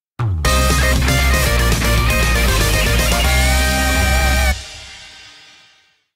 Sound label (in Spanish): Tema de Victoria; Castlevania; SSBU